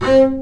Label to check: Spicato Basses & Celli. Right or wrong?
Right